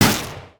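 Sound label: Attack Spark Effect; Dragon In A Box; destructibles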